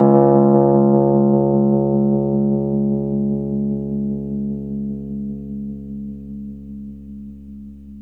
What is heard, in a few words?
Rhodes 73 Slow, C#; E-MU Producer Series Vol. 5 – 3-D Audio Collection; 3DRhodes